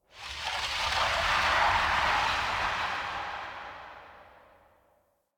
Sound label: Interior Cave Texture Whispers; Ambience; Misc Indoor; DE; Warframe; Infested Eris